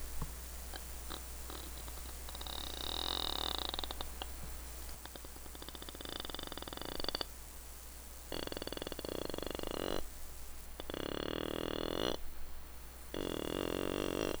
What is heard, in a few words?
creaking wood; SSB